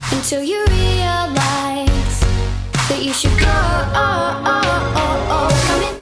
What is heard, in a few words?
whoop